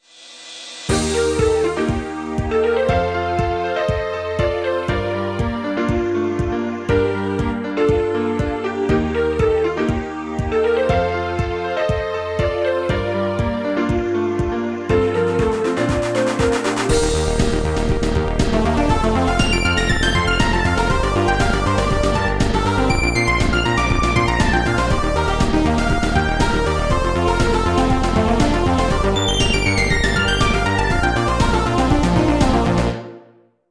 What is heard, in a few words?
piano idea